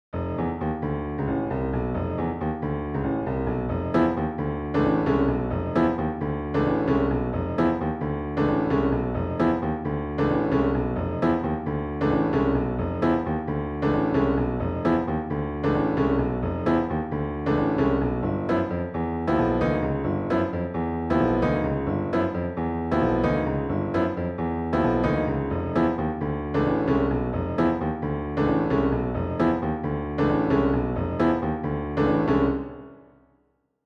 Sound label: miniature in jazz, piano; pieces pour piano